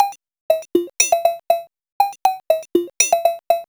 POLYMOD; AMG - Vince Clarke Lucky Bastard

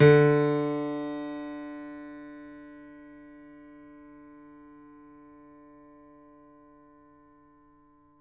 piano; East West; The Ultimate Piano Collection

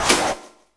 SD, 170 BPM; Ueberschall; Drum & Bass Resonance

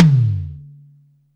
NOISE HI, TOMS; Back In Time Records; Big Bang

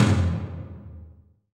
TOM TOM, Roland

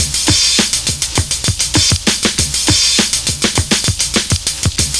BUZZ BEAT; Univers Sons - Kitch 70s

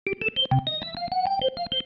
Single Riff, Organ

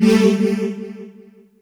string; Techno Trance Essentials

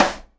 SIDE STICK, Live Snares

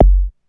KICK deep, Techno Trance DRUMS

